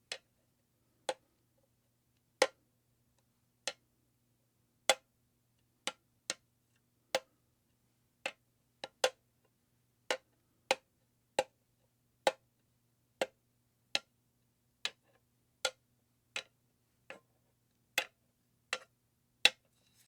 wood tapping on board

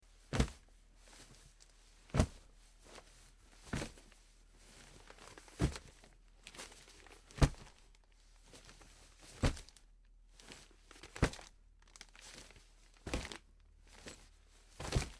paper stacking

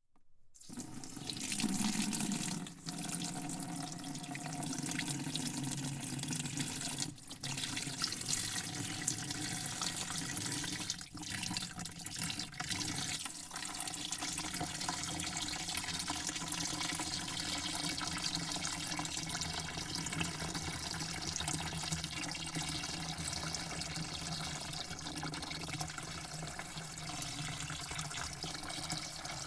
water spray into bucket

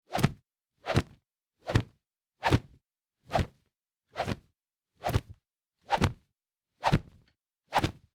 karate hit

